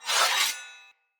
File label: Sphere Sword Whoosh Accent